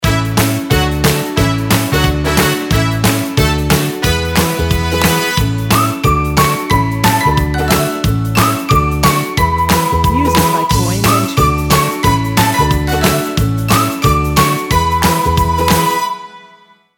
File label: stinger